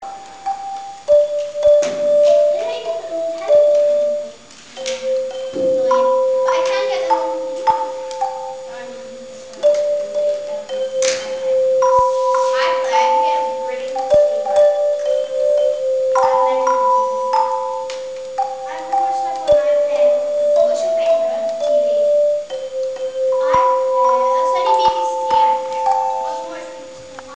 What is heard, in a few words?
handchimes